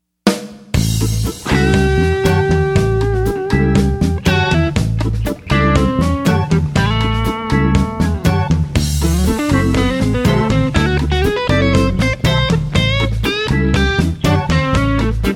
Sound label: Blues Rhumba Jam; Warner Bros Publications